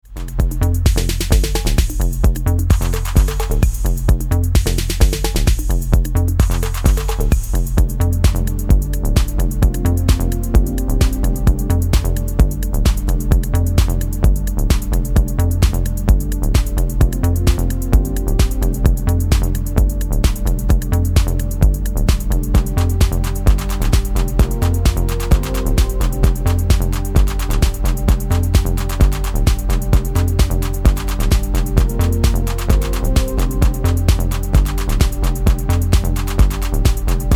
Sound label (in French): orgue electronique; House